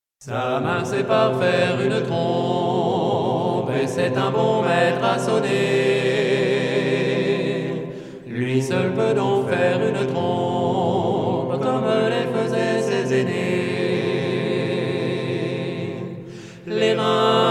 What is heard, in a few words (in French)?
Bigornes d or Chant, trompes